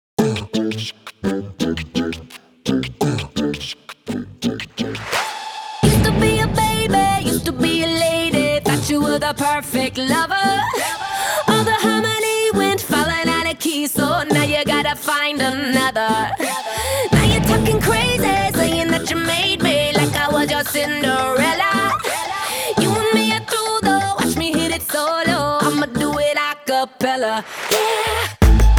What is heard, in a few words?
Acapella